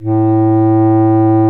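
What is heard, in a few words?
clarinet A1; AKAI S-Series CD-ROM Sound Library VOL-8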